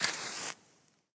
Light Candle; The Town

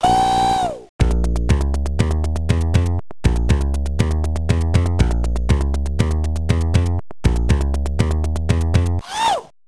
Dance Attack (with voice)

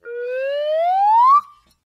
Slide Whistle

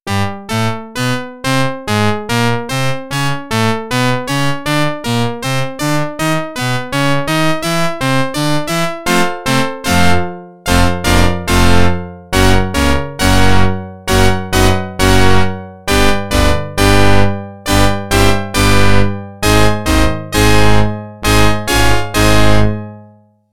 Organ; NHL 94

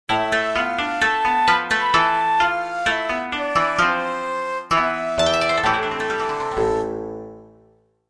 Japan Music; Civilization Revolution; Xbox

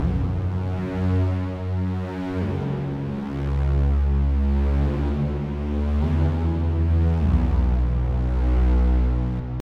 Bass Layer

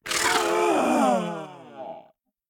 Stagger; Devour; The Inn; Zara

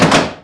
Bumper; Marble Blast Gold

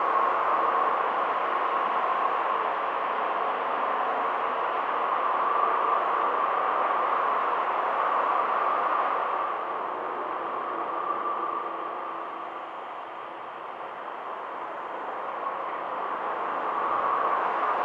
weather wind dry

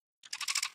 Sheep Voice; Doodle Jump 2

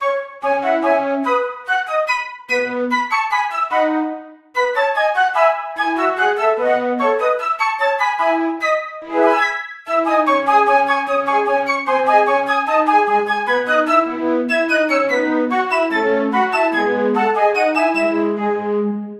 Safety Song Flute; gamerip; the bards tale remastered trilogy

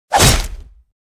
Warrior Strike

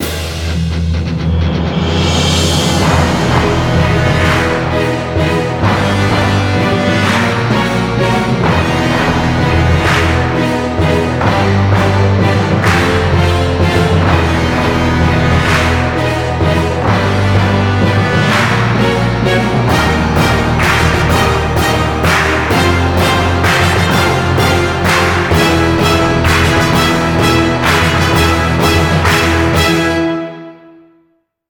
Victory (Win); Thunderhead; Speed Racer The Videogame; PS2; Wii

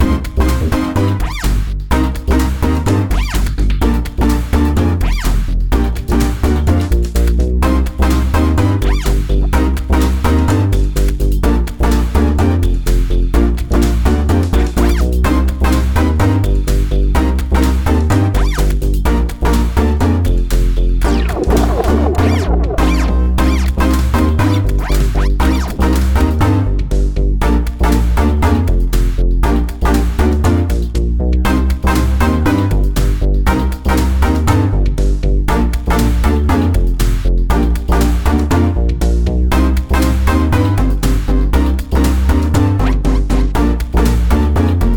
danger funny; Identity V

